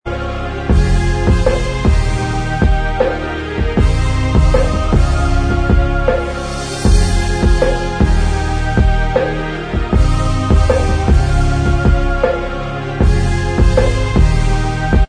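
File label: instrumental track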